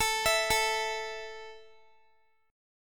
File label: A 5th A down Guitar 4F,4A,5C,5G,5C,6E, A5 Chord; arpeggiated; JGuitar